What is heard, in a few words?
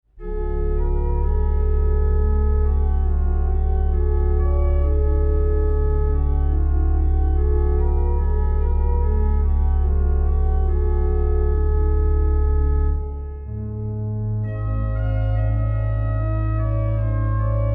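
melodie, organist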